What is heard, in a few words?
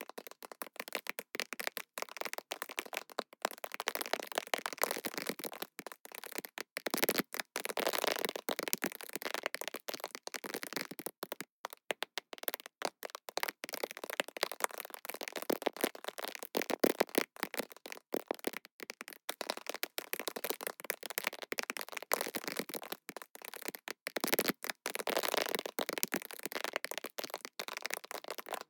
DESIGNED DRIPPY ON TARP FOLIAGE, Rain; PMSFX; Rain Designer